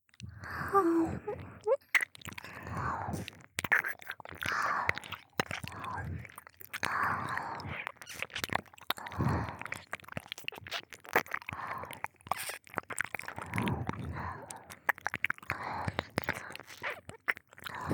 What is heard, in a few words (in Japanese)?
ロリアイドルの両側耳舐め; 童貞大好きロリアイドルたちに貞操を狙われるお話♪; ダチュラスクリプト